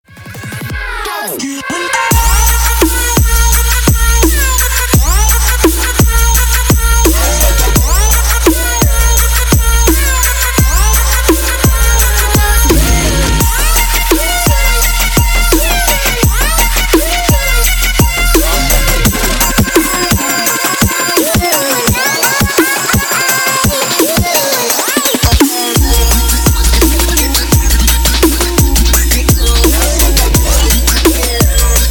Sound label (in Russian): trap ringtony na zvonok